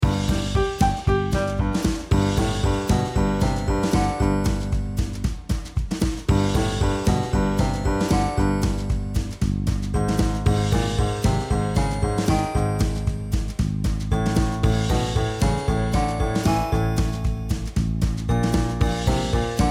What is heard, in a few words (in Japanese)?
グッグトレーニング用・男性低音